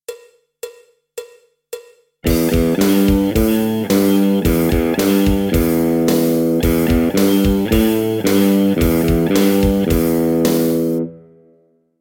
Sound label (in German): Metal-Rock Lick, E-Gitarren